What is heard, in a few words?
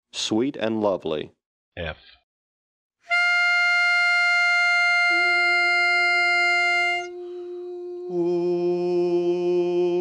Bass, barbershop quartet